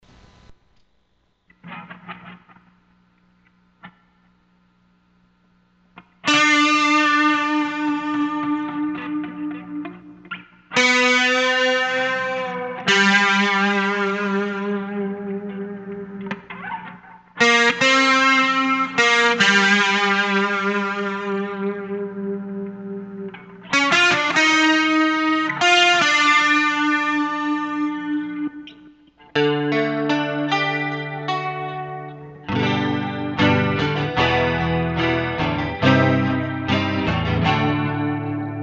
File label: guitars